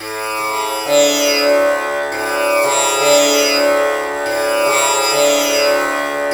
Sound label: TAMPURA; Spectrasonics; Heart of Asia